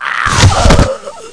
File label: death; Alien Arena; hunter